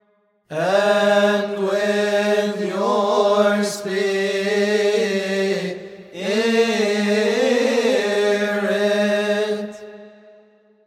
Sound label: And with Your Spirit